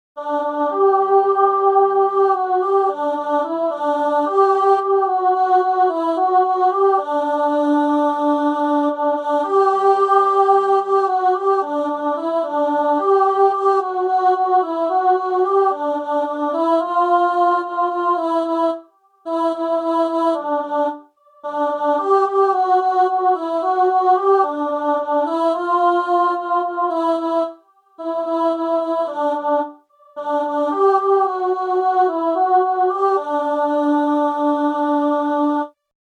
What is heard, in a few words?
Alto; Siyahamba